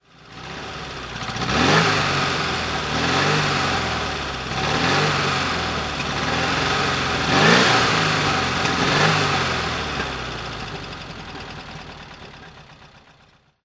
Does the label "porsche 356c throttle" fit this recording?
Yes